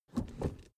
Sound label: suv idle 1st gear change